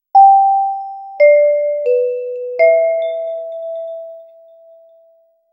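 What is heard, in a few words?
clean restaurant notification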